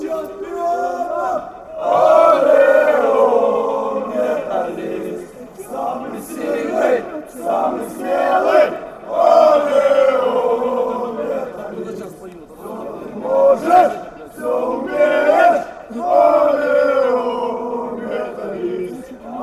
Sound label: metalist chant, fanchants